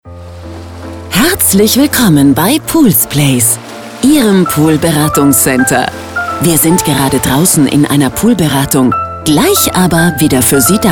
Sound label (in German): Musik; AB Poolsplace